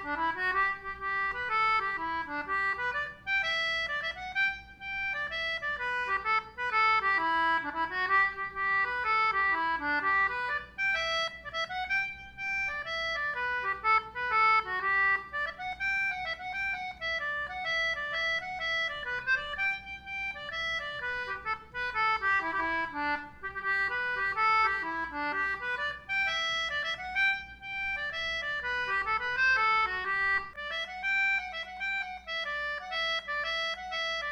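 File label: Celtic Button Box, hornpipes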